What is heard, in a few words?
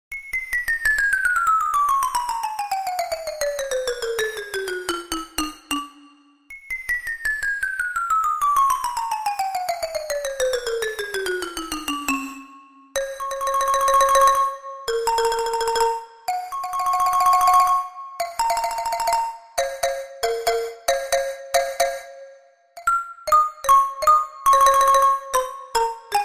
Marimbaphon